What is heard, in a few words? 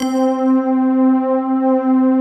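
glass waves; JV PADS; Pro-Rec - Synthsonic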